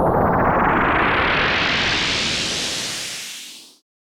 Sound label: explosion